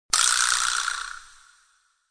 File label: VIBRASLAP; UISound